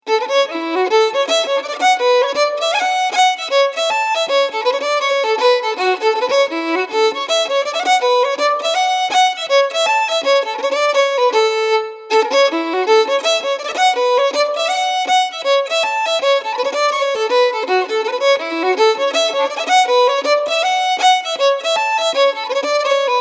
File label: Walshs Hornpipe